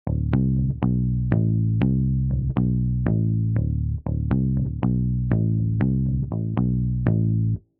Bass

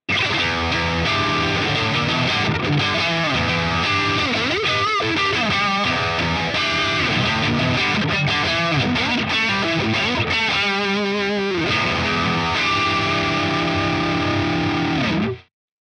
Gnarly Rhythm; Archetype Abasi

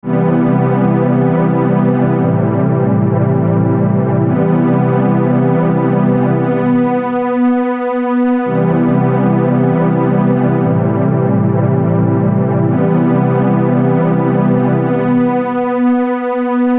pad

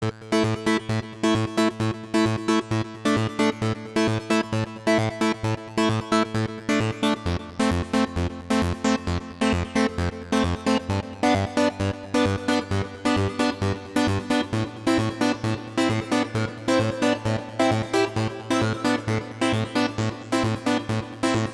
MiniMax

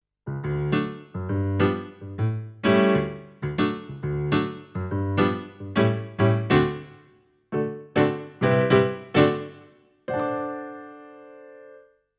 Piano; Gewa PP 3

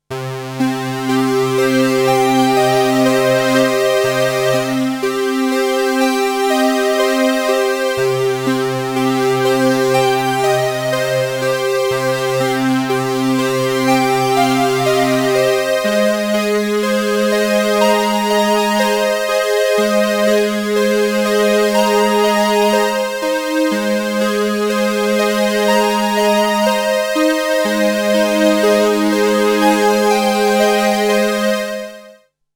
Boss CE-300 Juno Chorus II

